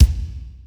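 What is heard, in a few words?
AMBIENT KICK, M1-M3R; M1-M3R KITS; Greytsounds VOL-1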